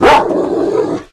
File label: mdog attack; STALKER; customnpcs